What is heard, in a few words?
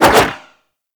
Golden Band Staff Spin Whoosh; Warframe; Monkey King